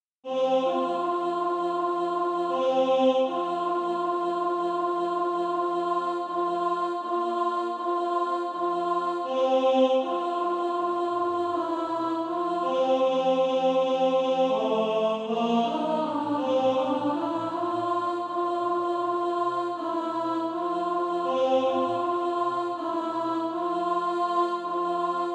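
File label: alto, Practise Music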